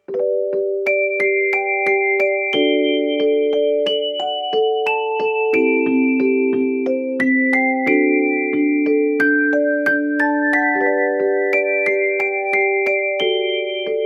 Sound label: orgel ver.; Meikuru Welcome to Happy Maid Life